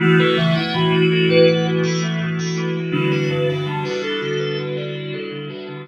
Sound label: Wave Rider, Keyboards